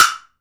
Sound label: woodblock; Africa